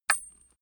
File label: small metal drop on wood soft; industrie wirtschaft; metall